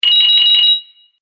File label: Collect Jewel Fast, video game sound effects